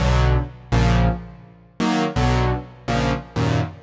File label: DEEPHOUSE ORGAN 125 A